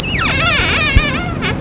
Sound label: long squeak